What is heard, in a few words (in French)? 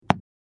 balle, padel